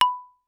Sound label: Xylophone hard rubber ff B5 stereo; MIS Pitches - 2014; Percussion